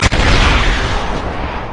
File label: attack; Xerath